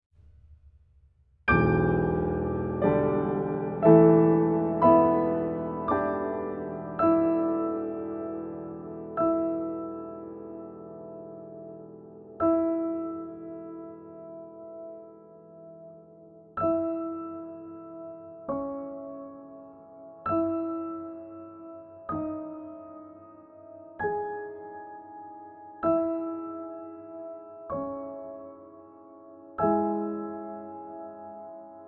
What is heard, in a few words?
harp resonance